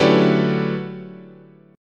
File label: D Major 13th, chord